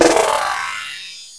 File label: ASCEND; Rarefaction; Poke in The Ear With A Sharp Stick